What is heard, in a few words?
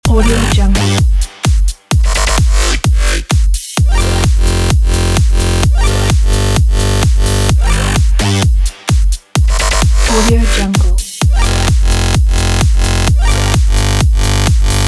the complextro loop